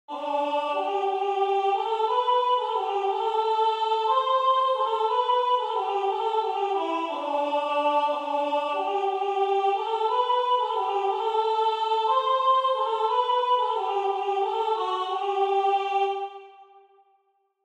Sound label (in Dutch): Alleluia Sopraan